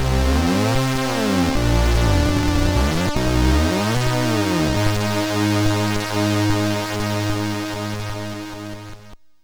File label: MOS6581R3; sid